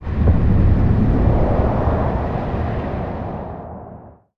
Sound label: Digging Machinery; battle suit aces